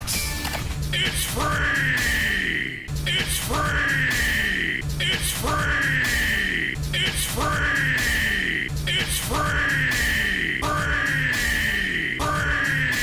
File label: chant